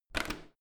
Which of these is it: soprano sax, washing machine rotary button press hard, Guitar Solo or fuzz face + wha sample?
washing machine rotary button press hard